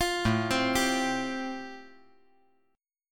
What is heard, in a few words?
A-Minor 7th Sharp 5th, chord